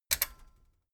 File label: metal button stereo click enable heavy